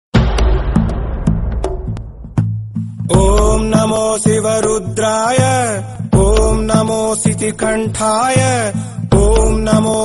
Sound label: Telugu Ringtone; Khaleja